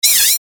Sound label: Static Spiral Wah, Static Short High Sweep; Production Element Imaging Element Accent Transition; Blastwave; Static Sound Effects